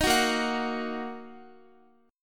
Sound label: Bb Suspended 4th Sharp 5th Bb down Guitar; strummed; tuning 4E,4A,5D,5G,5C,6F